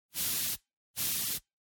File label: Air Duster